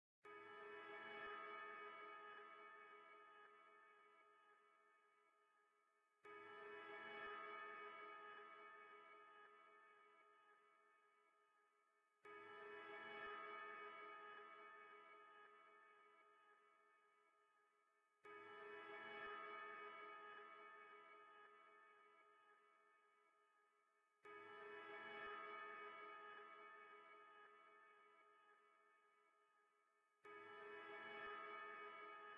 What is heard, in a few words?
amb